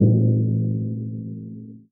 echoey, Misc Percussion Tympany

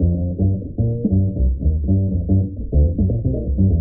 bass, Phonky loops

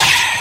"ah" EXHALE

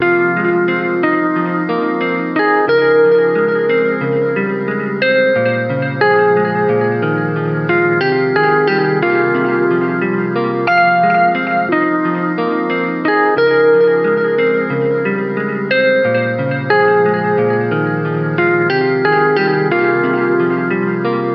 guitar, Sad

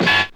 Stabs

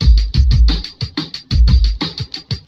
heavy bass, boombap drum loop; Drum loops

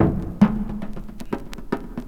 funky space, Drum loops

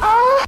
"oh" female orgasm; ooh ahh

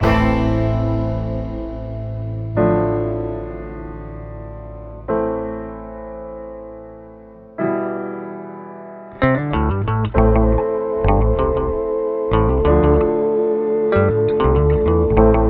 piano and guitar and keys dark fonk, loops; Phonky

